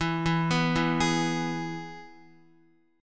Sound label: E-Major E, Guitar; arpeggiated; JGuitar